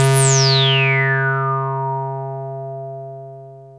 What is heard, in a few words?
303 C3, TB 303